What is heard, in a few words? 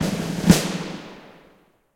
UI Quest Complete; Inotia 3